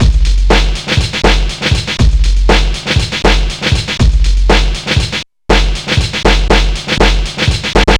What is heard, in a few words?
drum